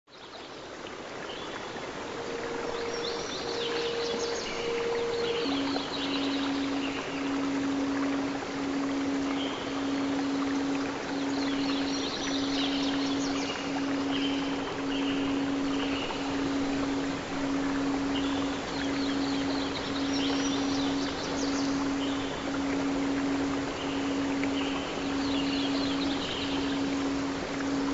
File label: Relaxing Forest Sounds; Remove Unwanted Hair Permanently!; Legs; Bikini Area